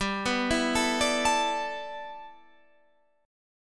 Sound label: G 6th Add 9th G, chord